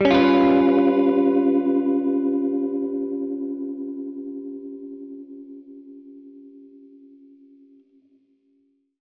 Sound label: Gretsch Chord G7b5, Guitar Chords; undercover samples